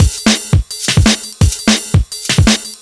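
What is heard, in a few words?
Drum and Bass Beat 170, drumnbass 170bpm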